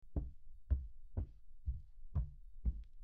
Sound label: footsteps on wood floor